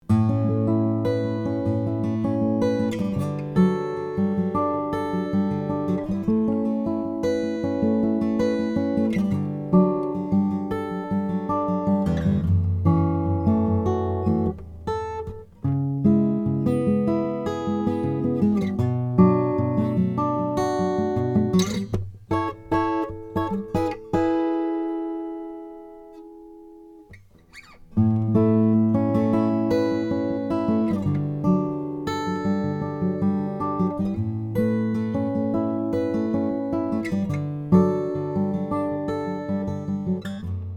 franklin om brazilian rosewood, guitar

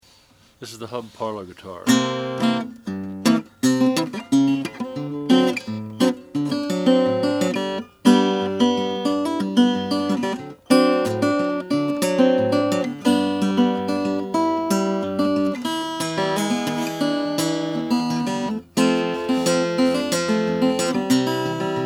haynes hub model 17 natural, Guitars Acoustic; HUB (Haynes/Bay State) Model 17 Parlor Guitar ca 1895

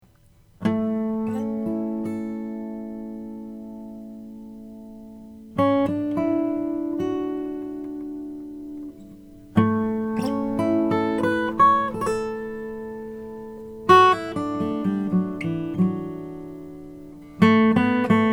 olson sj indian rosewood

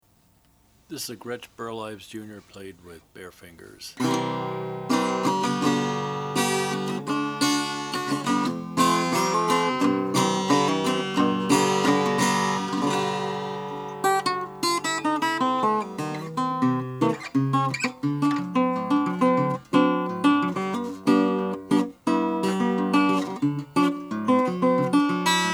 gretsch junior natural mahogany; 1954

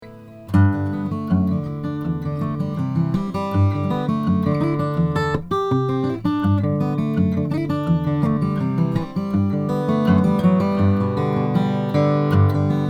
goodall honduran rosewood

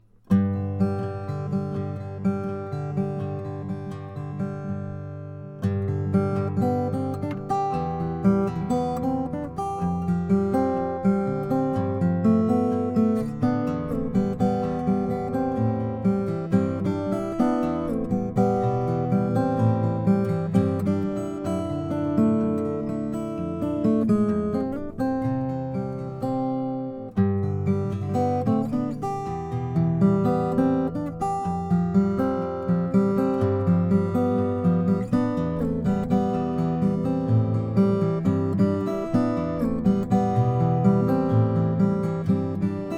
john kinnaird 00 12 fret black white ebony

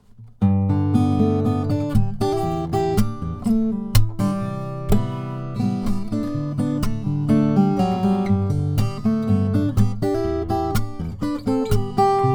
2023 lowden s 50 12 fret ko ko all koa

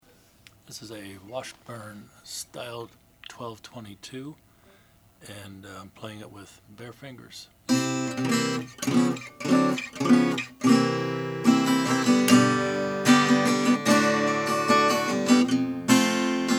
washburn style 1222 natural brazilian

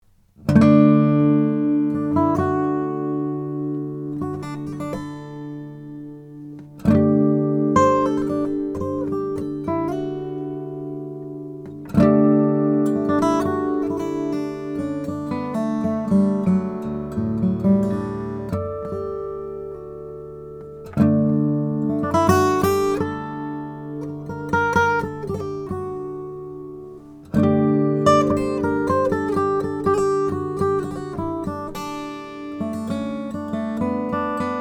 lowden guitar; george lowden

